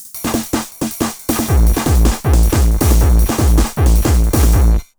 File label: TH 909 160, Hard & Loud Techno